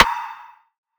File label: Snare, A; Drums; One Shots; Humble King Snare; Cymatics - Humble Hip Hop Sample Pack